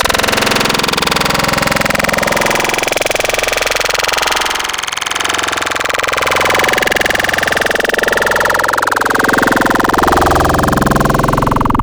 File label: Growl Tables; Levitate; Cymatics; SERUM; Growl Tables Vol 3